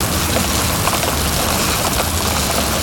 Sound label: gravel tread; vehicles